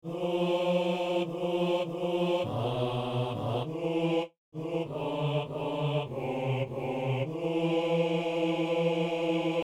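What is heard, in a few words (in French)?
toi qui nous aimes écoute nous seigneur, hommes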